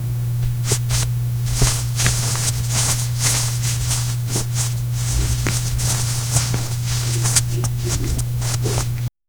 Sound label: the sound of long fingern, clothing